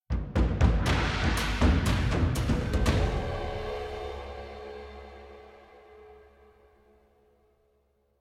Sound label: String; Assassin's Creed Unity Dead Kings